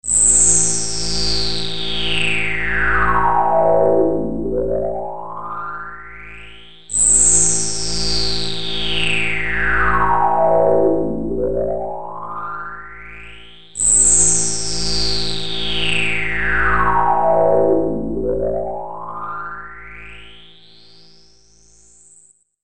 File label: Electro Ringing